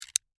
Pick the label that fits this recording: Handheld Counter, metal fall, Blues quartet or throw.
Handheld Counter